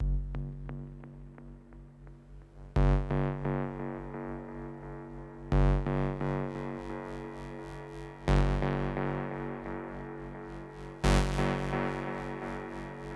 Music Tension Pulse Low Tempo; Battlefield 3

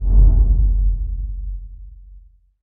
Panorama Popup Reveal

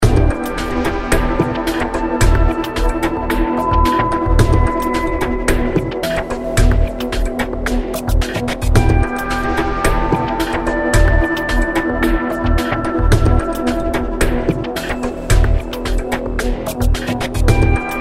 sci-fi theme loop; Technolites Episode 1

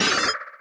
Drone tv fx Hit

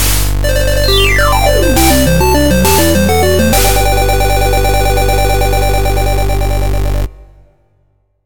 Result 8bit

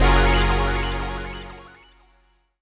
Jingle Course Clear!; AR Games

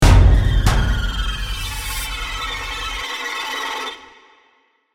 Stinger, soundtrack

